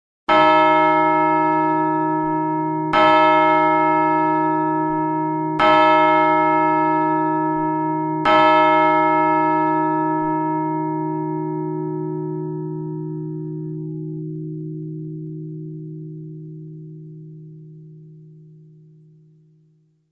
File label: Tolling 4 O'clock; Ingrams